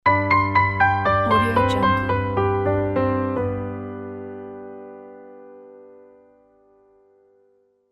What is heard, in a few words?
crystal piano logo; evanni